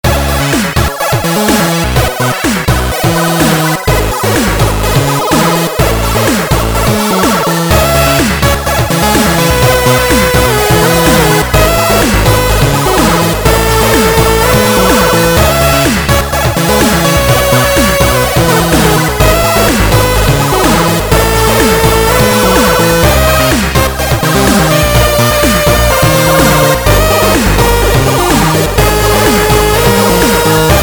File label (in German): musik; GXL ShaderToyMark 0.2.0